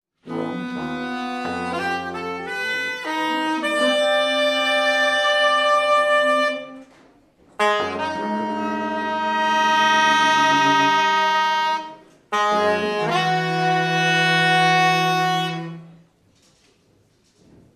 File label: SAX MAFIA